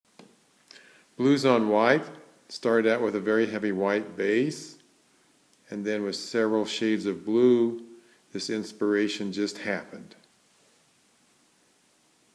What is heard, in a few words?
Blues On White